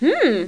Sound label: hmm